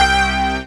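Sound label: synth dance; Best Service Gigapack 1; SYN 06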